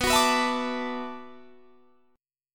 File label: B Minor 11th B down Guitar Standard; Bm11; strummed; capo=2